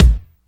bass drum a key, Royality free kick drum tuned to the A note. Loudest frequency: 448Hz; kick drum samples; single hits one shots